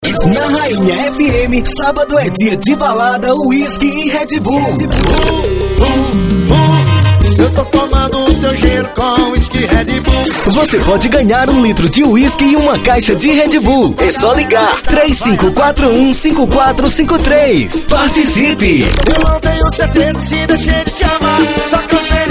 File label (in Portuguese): vinheta